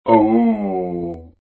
Ooooh!; Voice Cloak Plus; Acousticons

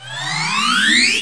stealth fade in

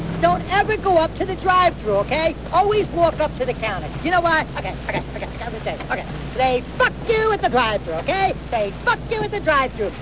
Exit Windows

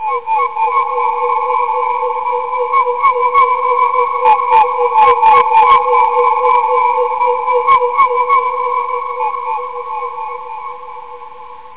RAIN FOREST; 17 Bit Software 2: Collection B

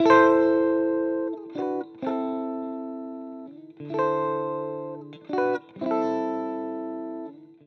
ELEC GUIT ETHNO 125 A, Guitar; eJay

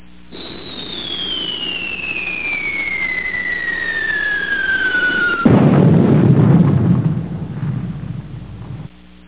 shell

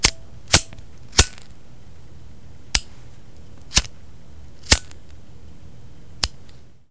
lighter; Select Soft Publishing Stock Clips Multimedia Clips

